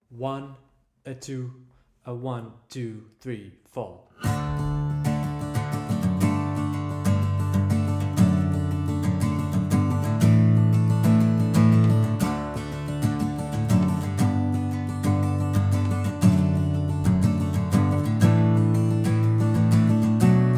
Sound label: Guitar Full Speed